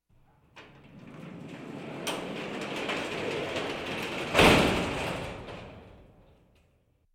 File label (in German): garagentor schließen (manuell); Auto-Zweiraeder